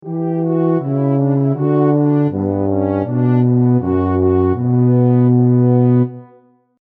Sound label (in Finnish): basso